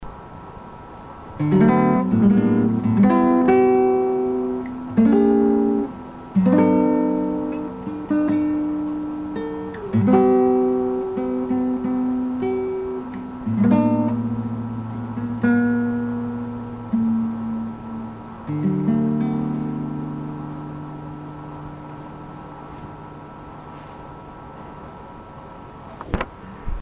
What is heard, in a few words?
Guitar